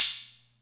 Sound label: Atlantex Music Percussion Computer Cymbal Short